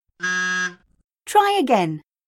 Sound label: wrong